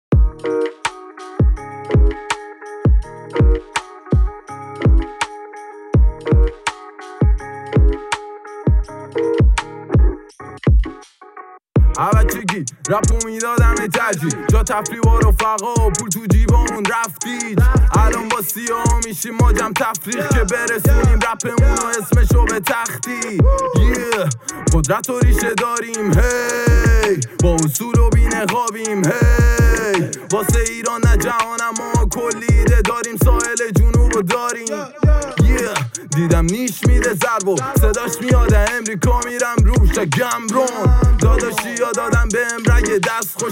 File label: Trap Bandari Freestyle